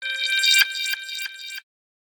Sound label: biosphere ringtone